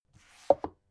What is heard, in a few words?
book shelve soft short